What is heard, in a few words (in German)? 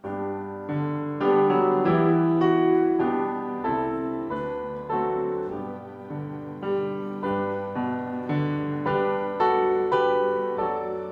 Vorspiel